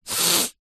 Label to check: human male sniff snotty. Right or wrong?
Right